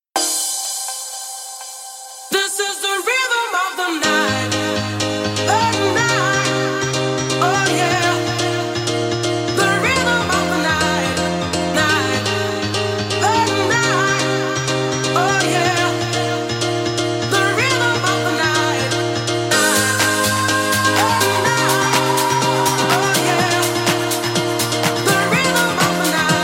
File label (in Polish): saksofon; saksofonistka na wesele